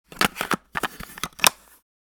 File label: Cassette case being opened